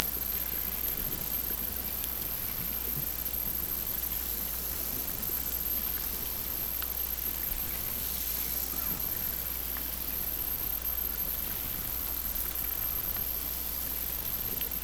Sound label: Hydrophones, orcasound